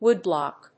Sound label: woodblock